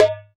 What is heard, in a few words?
Doholla Rim, Percussion